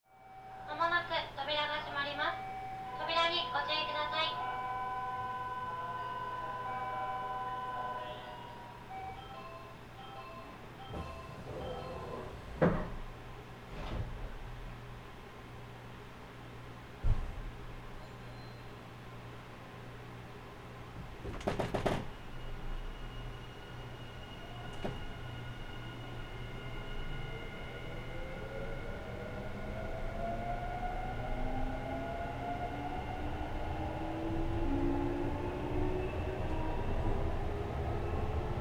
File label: Nankai 12000; 録音車両：モハ１２１０２; 和歌山市→みさき公園; みさき公園→尾崎; 尾崎→泉佐野; 泉佐野→岸和田; 岸和田→堺; 堺→天下茶屋; 天下茶屋→新今宮